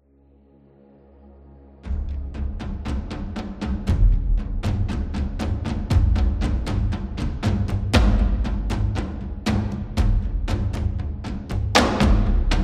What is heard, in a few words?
Tense Ambient; battle drum